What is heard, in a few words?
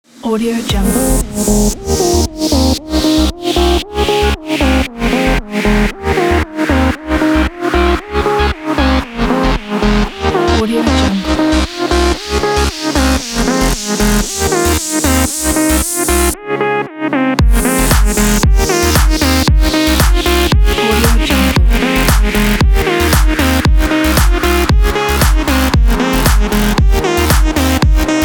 dance technology